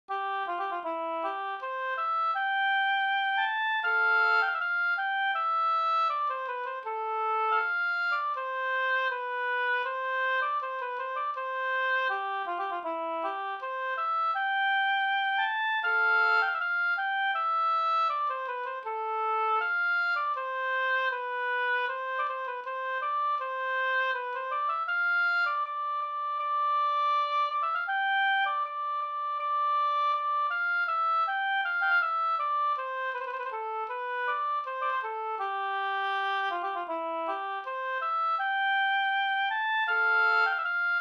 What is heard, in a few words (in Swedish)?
brudmarsch; Osterakers brudmarsch